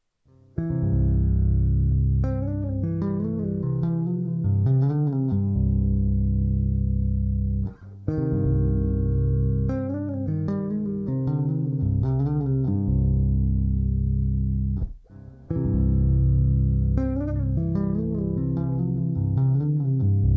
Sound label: Chord tapping